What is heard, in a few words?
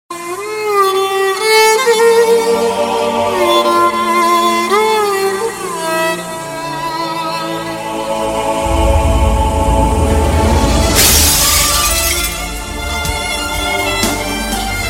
sad ringtone